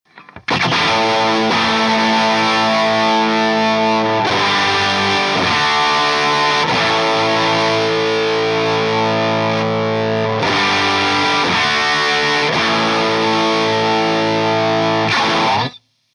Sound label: JTM45 Les Paul alles auf zehn